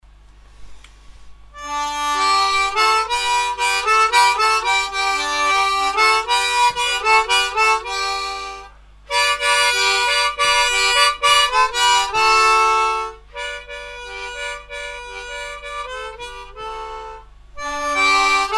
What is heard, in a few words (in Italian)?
armonica